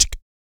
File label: VOC PERC, Vocal Planet Jazz FX BASS PERC